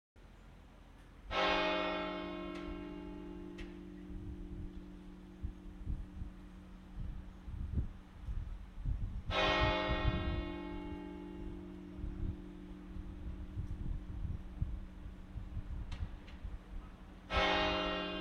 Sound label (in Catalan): campana